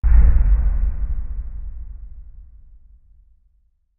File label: Bass Impact Low Drum; impacts